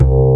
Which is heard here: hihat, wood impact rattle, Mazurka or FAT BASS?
FAT BASS